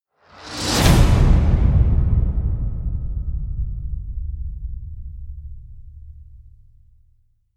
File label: metallic slams gut punch with whoosh